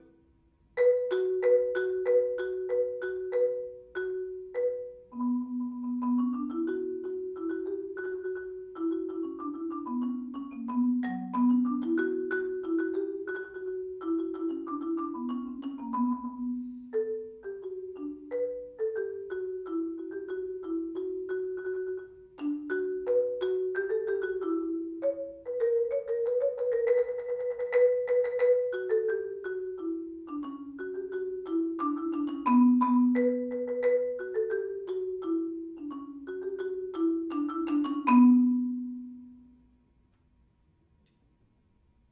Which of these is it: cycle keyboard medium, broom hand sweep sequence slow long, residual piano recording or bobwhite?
cycle keyboard medium